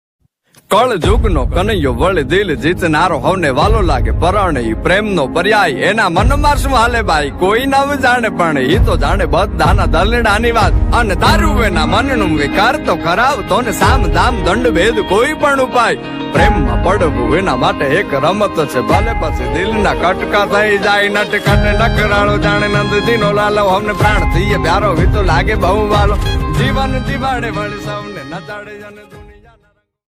gujarati ringtone

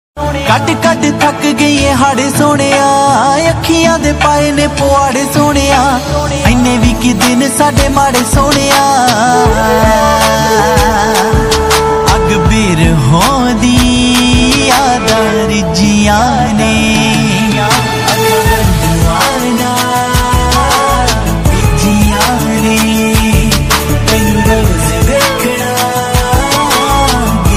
Panjabi Dj Remix ringtone

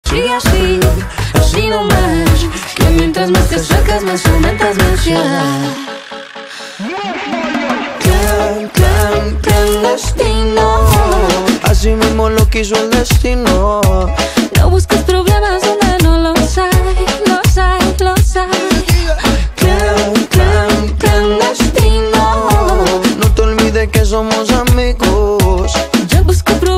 english ringtone

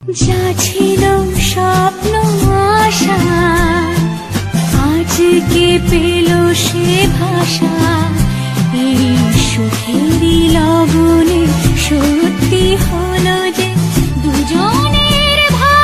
bengali ringtone

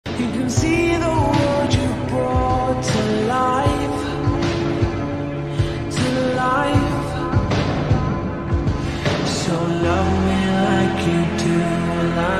slow version ringtone

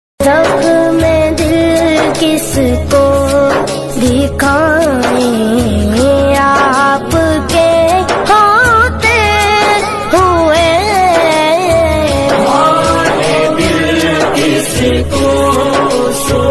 islamic ringtone

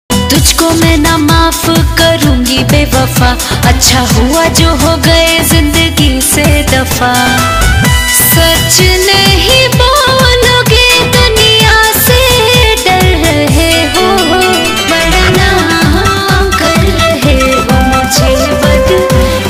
bhojpuri ringtone

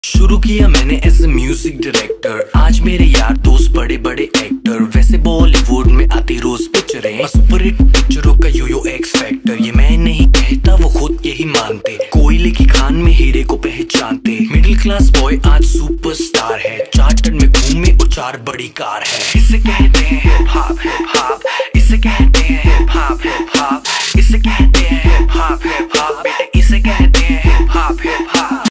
Hip Hop hindi ringtone

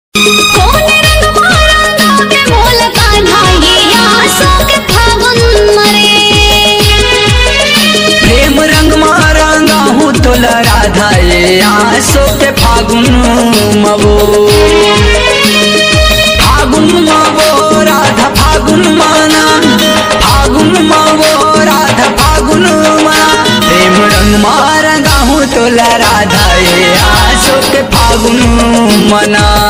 bhakti ringtone

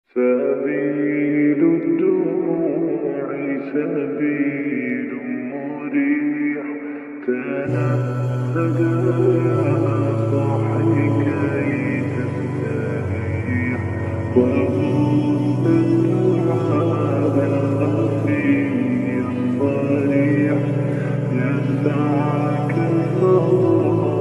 slowed reverb ringtone

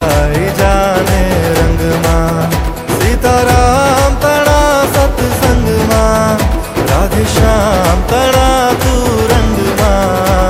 gujarati ringtone

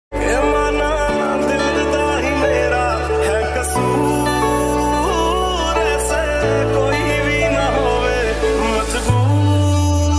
Punjabi Mashup ringtone

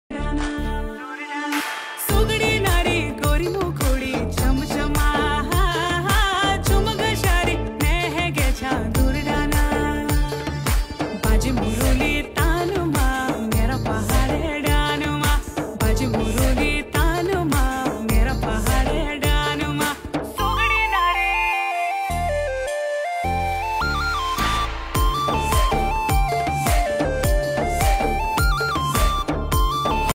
uttrakhandi ringtone

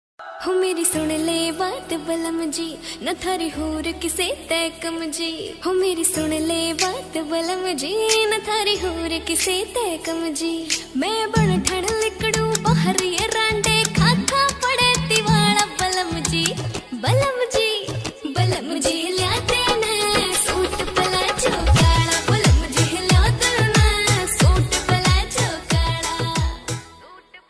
haryanvi ringtone

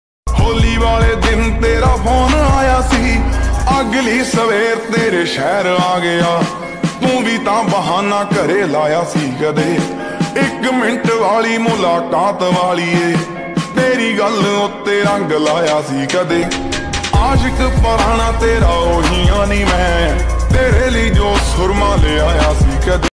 lofi punjabi ringtone

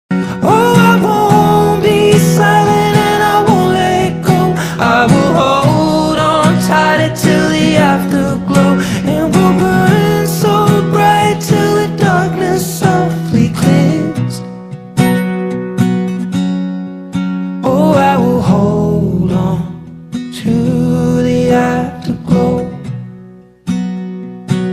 english ringtone

